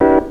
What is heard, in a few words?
CHORD; AMG - Vince Clarke Lucky Bastard